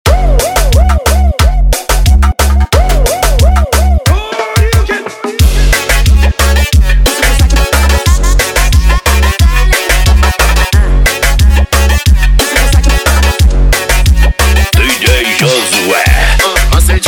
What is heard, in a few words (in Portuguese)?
BATIDÃO DUBSTEP